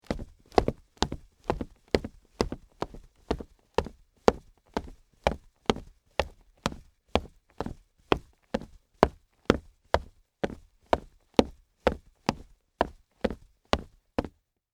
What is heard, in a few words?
Female Boots Run, Footsteps, Wood, Surface